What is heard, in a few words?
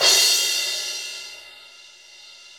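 cymbal crash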